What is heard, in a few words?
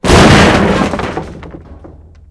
kick; Mafie 1